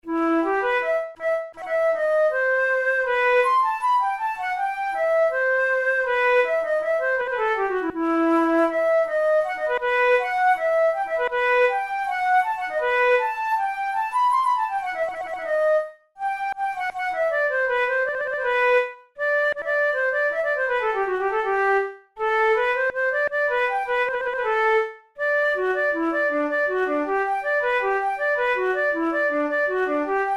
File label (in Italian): capricci per flauto traverso; ruge